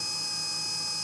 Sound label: tarantula scan loop